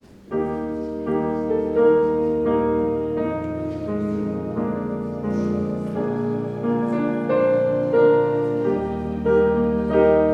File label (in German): Vorspiel